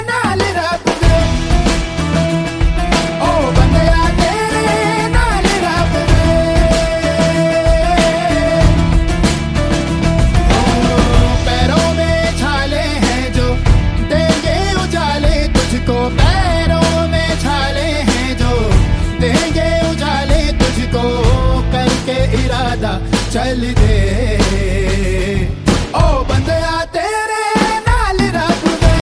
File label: hindi ringtone, bollywood ringtones